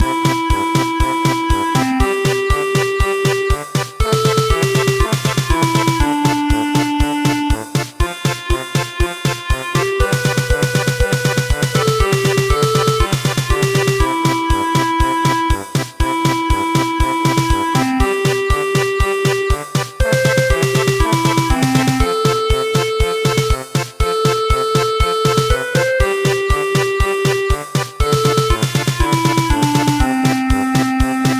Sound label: German Polka